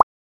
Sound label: message; misskey